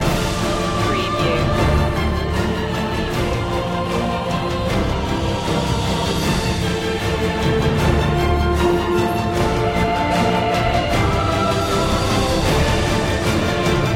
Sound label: no choir